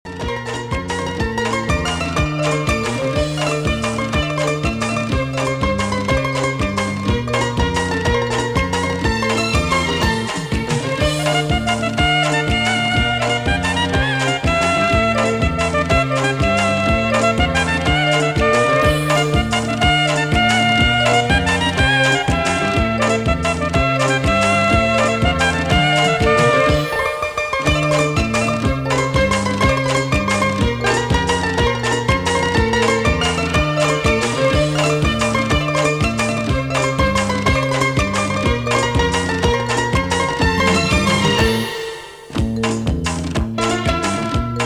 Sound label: Instrumental